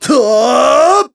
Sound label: Run Attack Run; Ghirahim